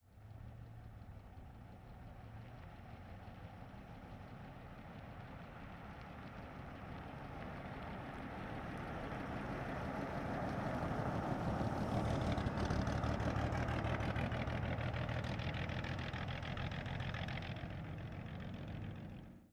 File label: 1937 Plymouth Ext Medium By; Period Vehicles